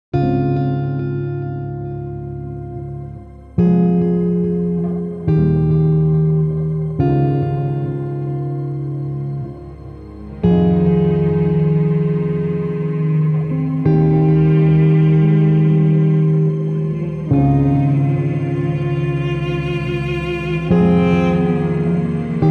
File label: Waves of Emotion